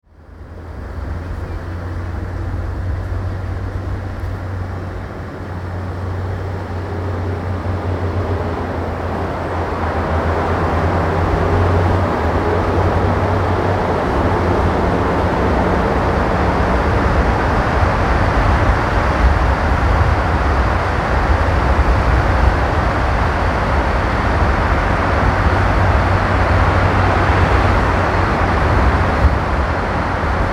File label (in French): tunnel